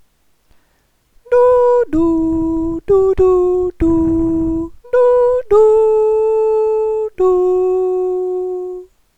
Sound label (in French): chante Si; Etude vibrations objet; Chapitre 08 periode frequence dB